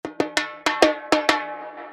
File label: Mini Timbale Fill 122 Wet Dm